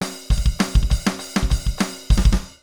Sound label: conflict drums